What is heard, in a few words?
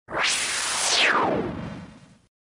lead Up